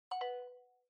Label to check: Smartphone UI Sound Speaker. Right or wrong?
Right